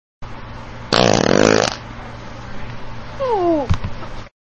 big loud fart

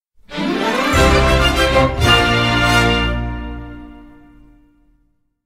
jingle; sonic lost world

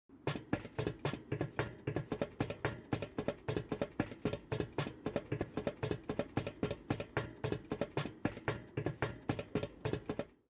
fake footsteps; rust

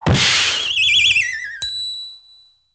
crash whirl and ding

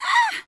hurt; morrigan

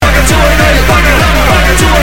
bagger 288

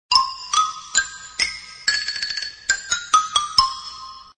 xylophone

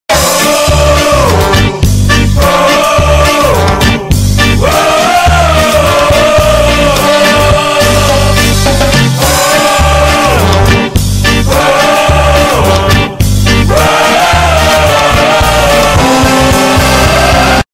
pirate call bass boost

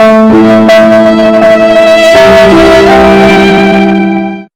bass boosted sad violin

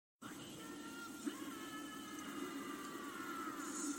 vocal

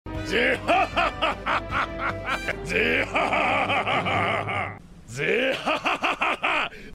zehahaha

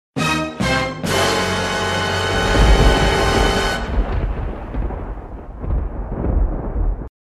dramatic music lightning

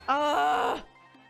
euhhhhh

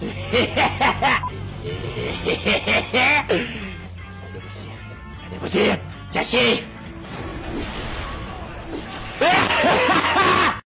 hehehehe; jogando de rengar

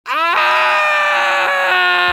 two screaming

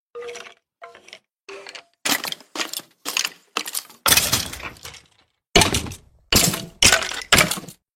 minecraft skeleton noise

